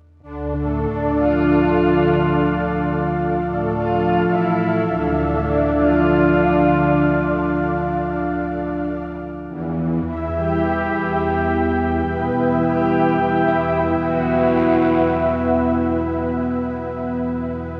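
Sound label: Headrush Synth LoFi Pad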